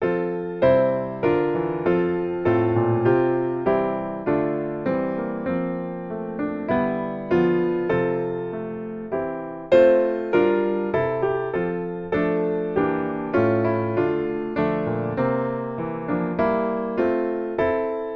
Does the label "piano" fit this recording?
Yes